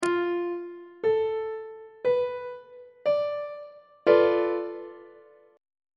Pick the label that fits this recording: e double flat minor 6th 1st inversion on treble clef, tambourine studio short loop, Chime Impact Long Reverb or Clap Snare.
e double flat minor 6th 1st inversion on treble clef